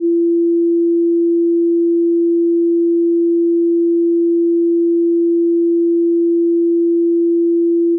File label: drum stems test, drum tracking